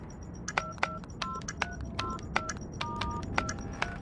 bomb defused beep

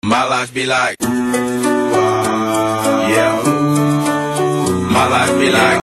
ooh ahh

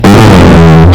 hmhhhh

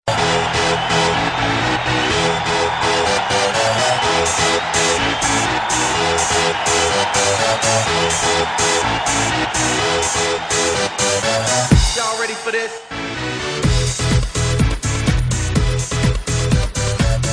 Intro Music

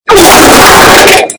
loud splat

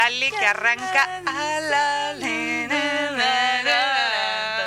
lalalalalala

